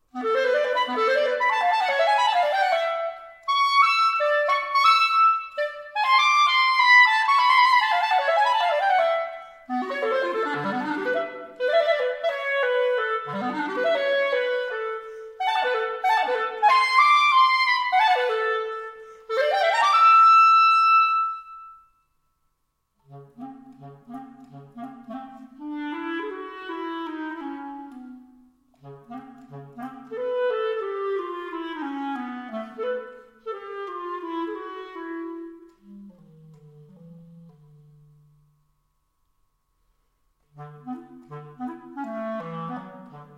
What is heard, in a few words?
Pieces for solo clarinet; Stravinsky